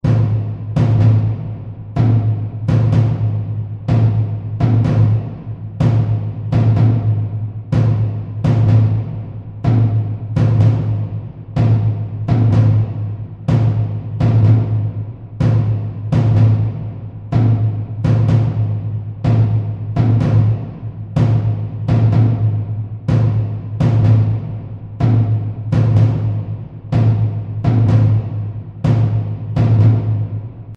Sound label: tension Loop; Dynamite Digger Eureka